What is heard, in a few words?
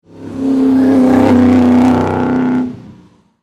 Race Car Pass By; Nascar or other similar power car drive by; Car Sound Effects; LYN110 package of Power Car sounds; Lynne Music